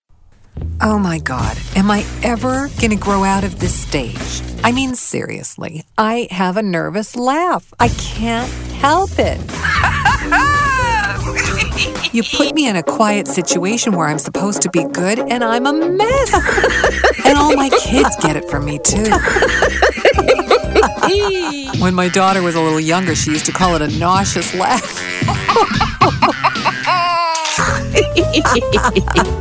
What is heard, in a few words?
NERVOUS LAUGH